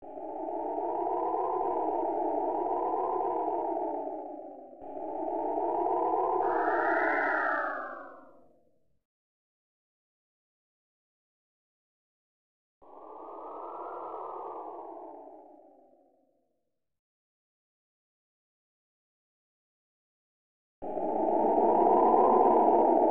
Wind; benkei gaiden; snes

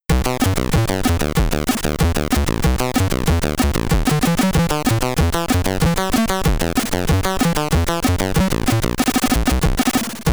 BGM (SID Stereo)

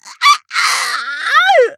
stagger

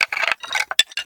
Bones Jump Scare; The Inn

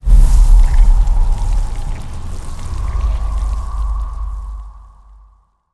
shadow vanish corpse

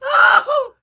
Blue Slingshot

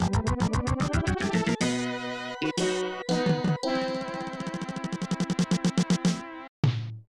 Finale Fanfare; super bases loaded; snes

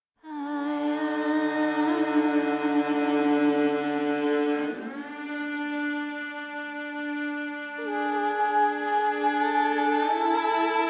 Monster Hunting; The Witcher 3 Wild Hunt; Skellige

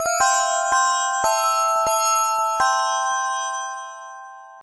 Daytime Bell

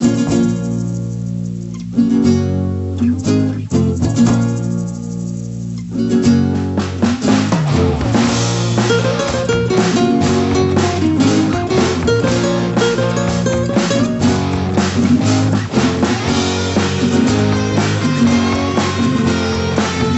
Music Mission Intro; generator rex agent of providence 2011 nds gamerip; Mexico

